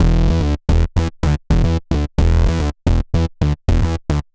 110 E, bass; musicradar; bass boutique

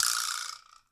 VIBRASLAP; PlatinumPhattCD2; E-MU Producer Series Vol. 8 – Platinum Phatt; Flexis Etc

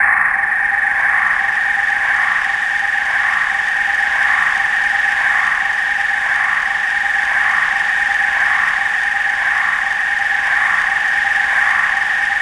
FX, Futurist Drum & Bass

